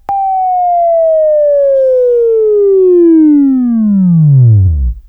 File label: Buzz, Sweeping Training